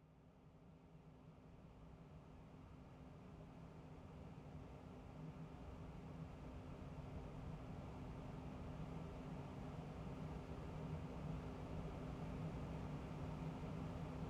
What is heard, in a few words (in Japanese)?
ガウンでお顔マッサージ（脱がせて着替えてふかふかガウンでお顔マッサージ）ASMR